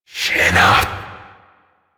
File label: Possessed Red Veil Vocal Attack; Enemies; Warframe; Priest Quest